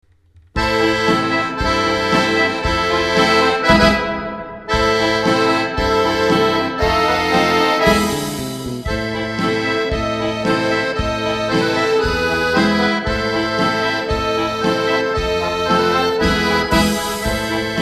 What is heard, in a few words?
Balkan